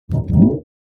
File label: Large plastic sheet wobble; gfxsounds